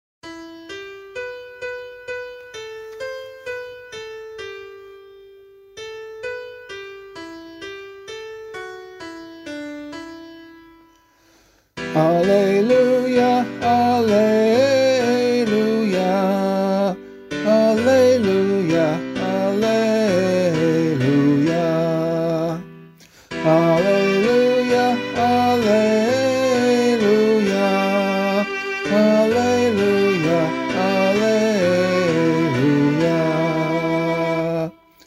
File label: vocal, Gospel Acclamation for Australian Catholic liturgy